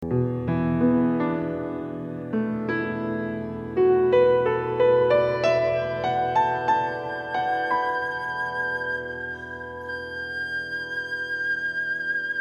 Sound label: transition